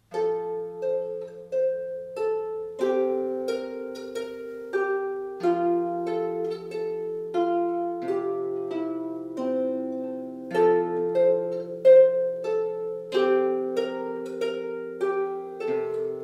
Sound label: Swedish Dance